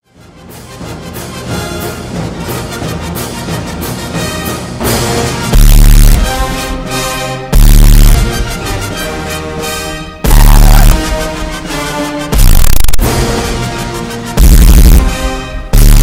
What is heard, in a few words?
1812 Overture (bass Boosted)